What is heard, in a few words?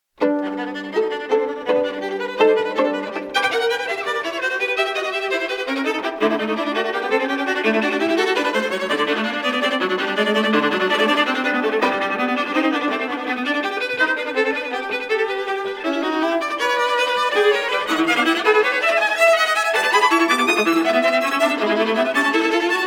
Gliere Etude